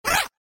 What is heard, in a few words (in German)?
monster attacke; Crypt of the NecroDancer